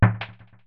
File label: tamp